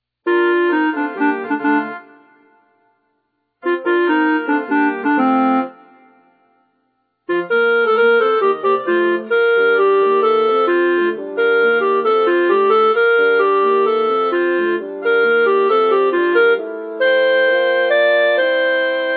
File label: alto; pfautsch